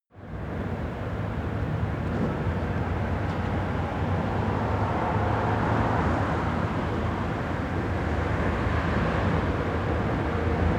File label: train passing medium distance